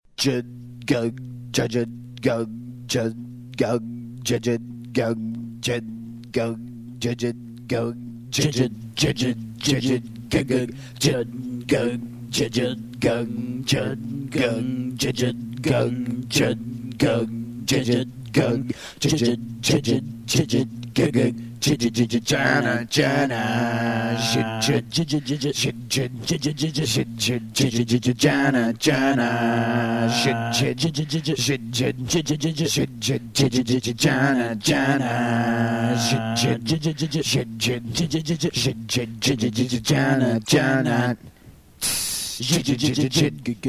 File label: wah wah song; lolicore; Lost Frog Productions